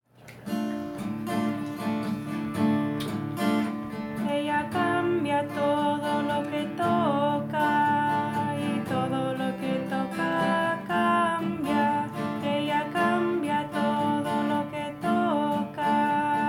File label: Melody